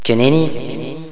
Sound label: kit; Villagina; ecole